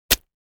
Paintball gun impact object splat; sport

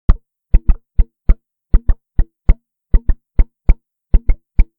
Electric guitar muted strings simple rythm

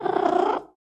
Cat purring

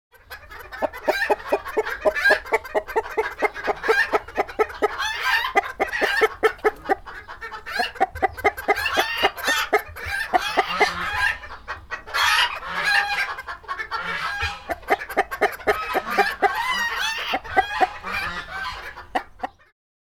Chickens clucking chicken coop

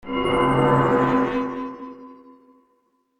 Sorcery spell cast

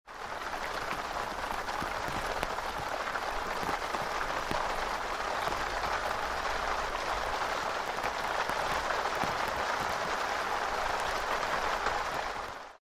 Interior of tent with rainfall outside

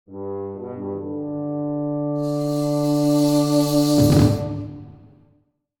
Empire game triumph success

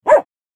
Medium sized dog bark

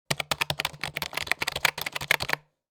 Mechanical keyboard typing fast

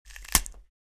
Finger bone break and snap

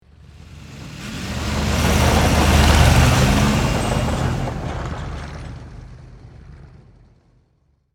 Tank passing by tracks rolling

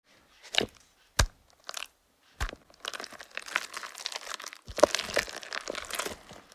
Stirring cake mixture cake batter

